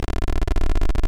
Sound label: SOUND ENGINE STAY; Super Battle City 2